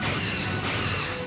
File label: Torpedoes; Defiant